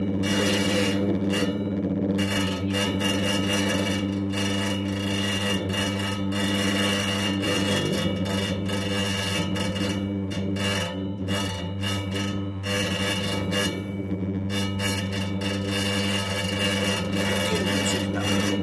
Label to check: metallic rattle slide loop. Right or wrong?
Right